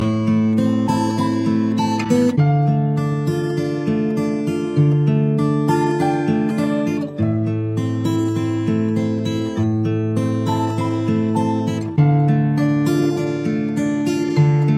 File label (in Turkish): Piyano